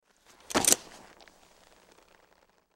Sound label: Horse Saddle Put On; Animal; Blastwave